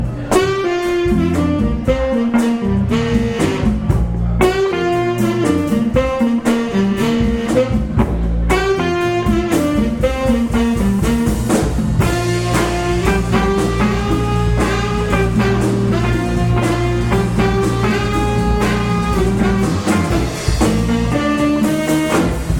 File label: Jazz & blues band